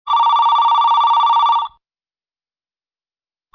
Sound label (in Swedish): Ringsignal